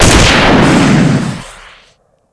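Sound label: rocket launcher fire; doraemon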